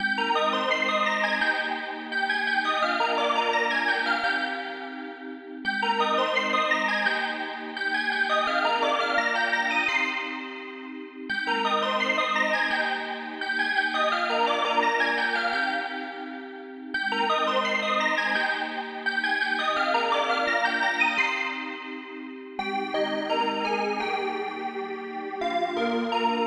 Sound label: sparkly pink sugar bells 170bpm